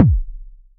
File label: kick